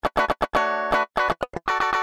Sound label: Cutting